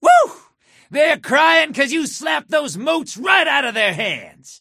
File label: theyre crying